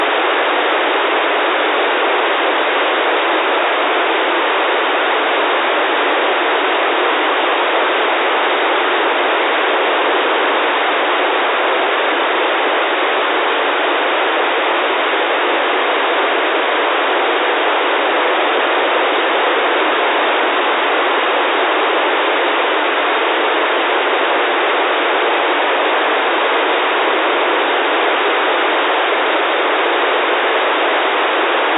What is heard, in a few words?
recording sdr wifi 2.4 Ghz